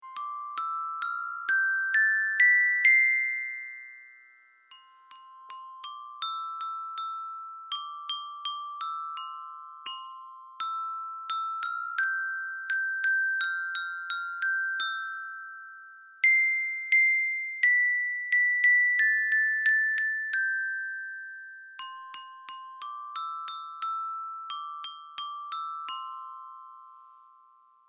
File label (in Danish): sonor mamma mouse klokkespil mus i trae; 2 museklokkespil i gaveaeske